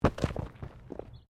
Body movement Impact Roll Gravel; Body movement foley to simulate falls and deaths; Rolling; Objects; SoundEffects-Foundation1

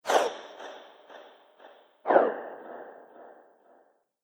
Magical Twin Whoosh; Andrew Fleming

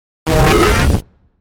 Hiss Noise Shine Warp; Production Element Imaging Element Accent Transition; 5.1 STEREO MIX; Blastwave

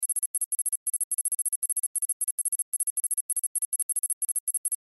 Sci Fi Type Beep Cursor Loop; Control Panels

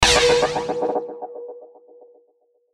Hit Bright Flange Delay; Production Element Imaging Element Accent Transition

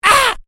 Created Creature Small Ouch, Alien Sound Effects; Created creatures, vocalisations and pretend speech

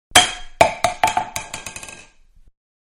Medium Can Fall On Concrete; Can Medium-Falling Onto Wood; Metal (Sound Effects:Materials); Manhattan Production Music

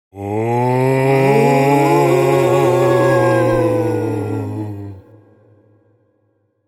A group of wailing and groaning ghosts; Horror

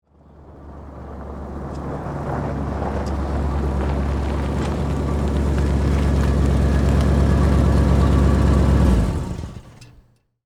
Jeep Approach Off, Automobile; Transportation; Jeep, Cherokee, Laredo, 1998, Exterior, Hatch, Back, Door, Open; BlastWave

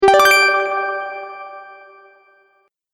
Magic Bright Bonus, video game

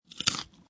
Bite Info Carrot Bite Crunch Munch Chew Chomp; Eating Sound Effects; Lynne Music

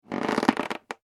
balloon rubbing squeak; Balloon Being Rubbed With Hands - Good Cartoon Stretching / Expanding Sound; Funny Sounds; Comedy; McKinney Sound

